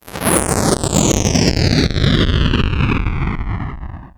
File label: rocket